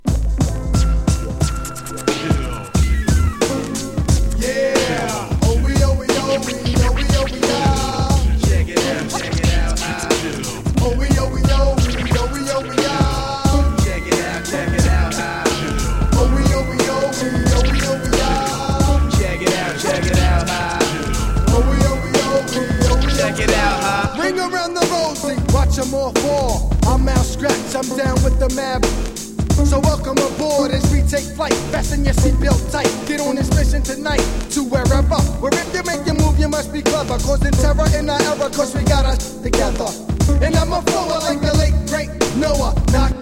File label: skratch chill